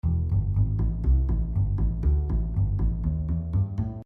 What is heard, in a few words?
bass lick; instrumentos; baixo; licks para baixo